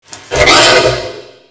wretch tunnels attack; A Machine for Pigs

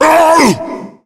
tank pain, zombie; Left 4 Fortress

